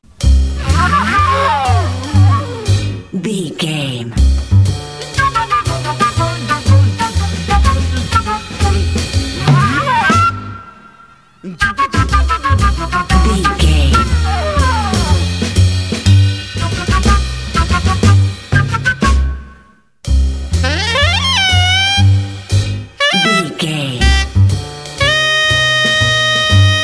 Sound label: jazz cartoony tongue and cheek